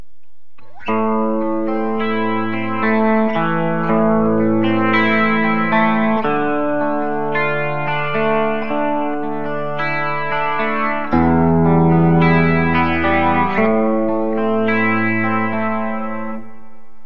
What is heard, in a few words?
bridge clean; classic57; Burny